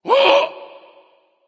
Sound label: surprised; smite; voicepack